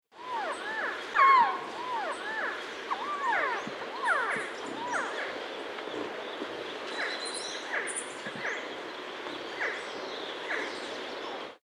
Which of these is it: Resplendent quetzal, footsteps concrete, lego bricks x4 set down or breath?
Resplendent quetzal